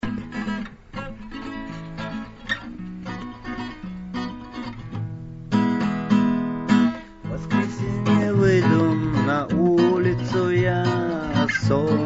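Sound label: guitar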